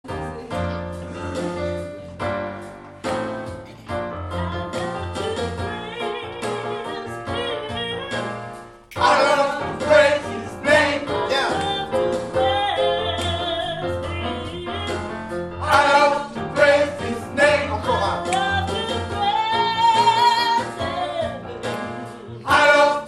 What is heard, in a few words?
tenor